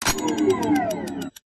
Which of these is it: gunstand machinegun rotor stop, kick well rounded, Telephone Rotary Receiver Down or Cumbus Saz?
gunstand machinegun rotor stop